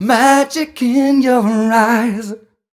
m 128 mel b-flat, Vocals

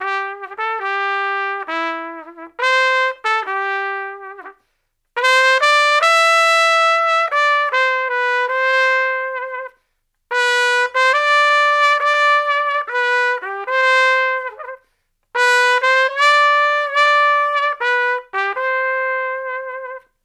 Trumpet Melody; Traditional; Sonic Foundry (Sony Creative Software); Jazz Solos and Sections

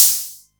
Roland MC09 Hat O

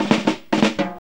FILL; Zero-G; Funky Elements

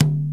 INDIAN PERC

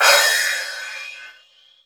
crash R; Zero-G; Chemical Beats; CRASH ZOO

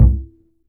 E STRING UPRIGHT BASS; Upright Acoustic Bass; Larry Seyer

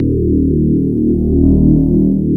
electric pianos; Wizoo Electric Pianos

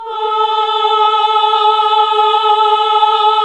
Nuns Aahhh, AAH; Sound & Vision - Gigapack II CD 1 (Roland); HAL NUNS AAH OOH

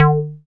Bass SH-101

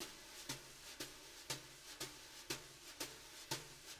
BR, BRUSH LOOPS; Sampleheads; Peter Erskine

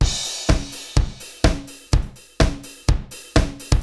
Akai XE8 (125BPM), beats; musicradar; cyborg beats

